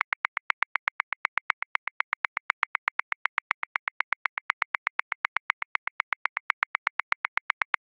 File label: Grunge Rock, Drum Booth, Grooves; Ableton